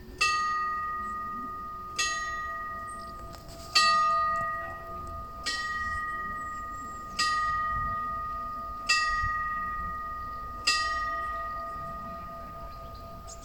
Glocke; Pilnitz

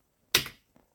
Tastatur Klick

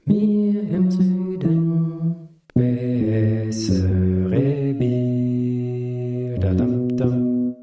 Gesang Bass

